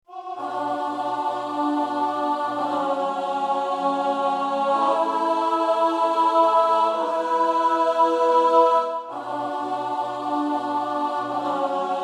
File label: Soprano